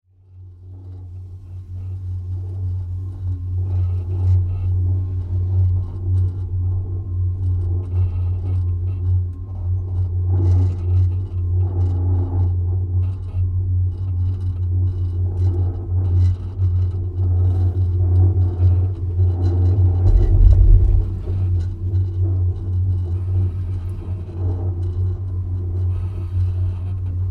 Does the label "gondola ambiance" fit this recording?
Yes